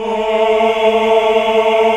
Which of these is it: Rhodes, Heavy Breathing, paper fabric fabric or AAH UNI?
AAH UNI